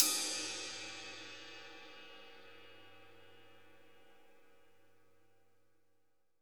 RIDE, GM drum set; AKAI S-Series CD-ROM Sound Library VOL-8

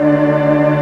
Barton organ; Barton Melo L+R

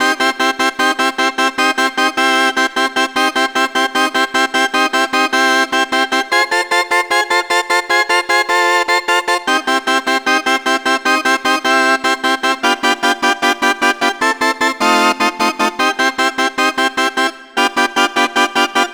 Surf Organ; Keys; Dr. Fink's Funk Factory

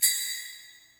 Jingle Bells, Yamaha PSR E305

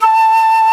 Flutes, Flt amb; Roland LCDP04 Orchestral Winds; 90 s Sample CDs; C Flutes 3-8

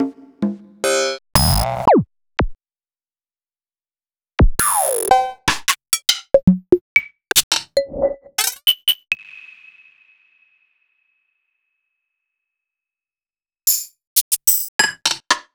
Percussion, Retrowave

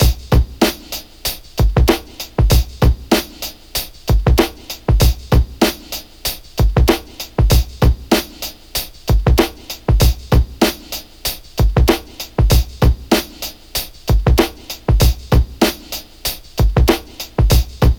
96 Hard Wet Slapper, Breaks; Lockdown Breaks

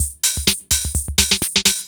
Dance Grooves

